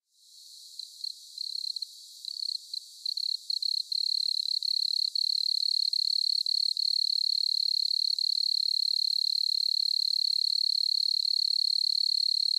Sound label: hime koorogi